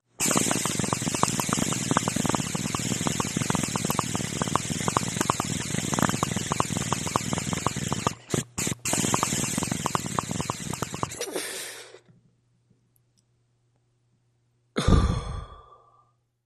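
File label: sounds of hookah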